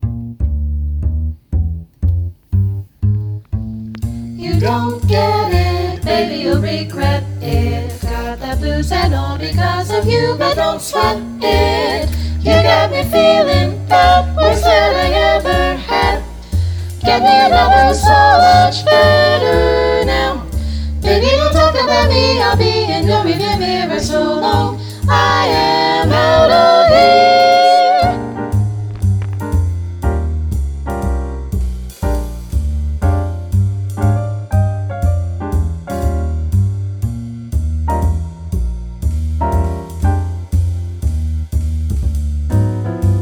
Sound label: Bb Vocal Jazz Etude no tenor; jazz-all-state